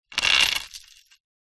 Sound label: industrial chain metal medium pull over metal